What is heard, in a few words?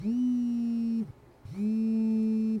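phone vibration recorded with an app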